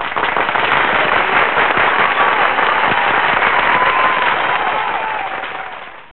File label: clapping